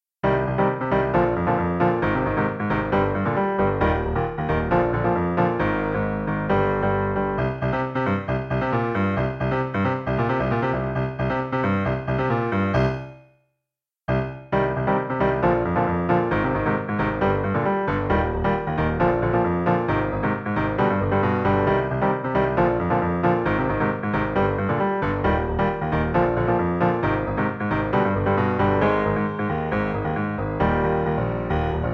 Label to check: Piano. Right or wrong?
Right